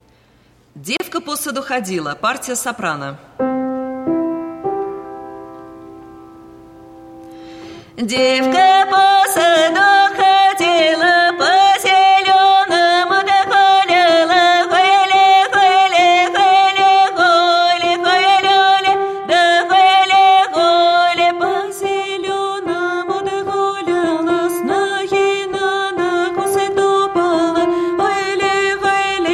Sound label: Devka po sadu hodila soprano, ruschoir